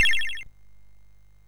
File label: sid MOS6581R3